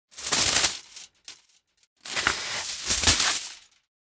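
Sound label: foil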